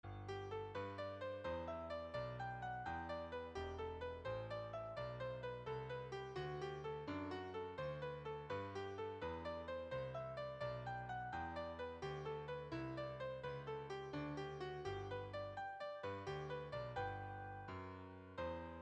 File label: Melody Bass